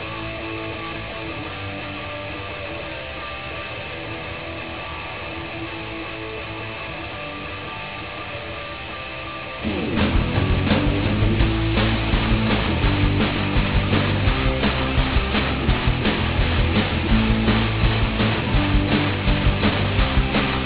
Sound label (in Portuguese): panico